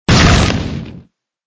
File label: Rock Asteroid Destroyed; Angry Birds Space